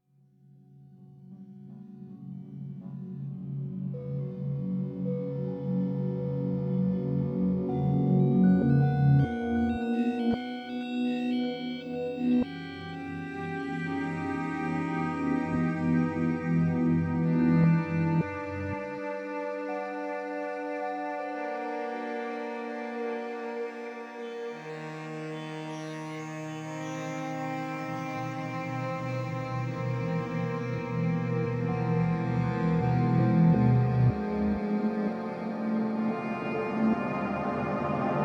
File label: BGM Clock Tower